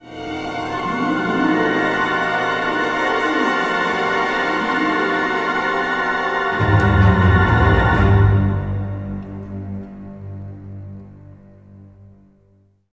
desert

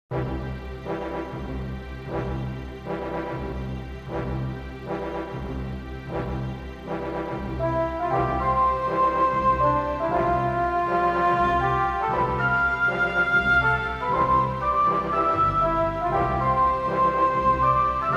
quiet loop; Indiana Jones and the Infernal Machine

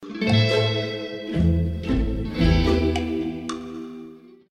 QUEST FAILED; grottesca 2

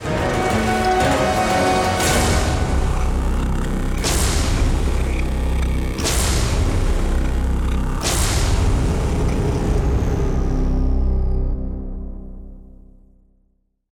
Boss Fade Out; Boss Flybot

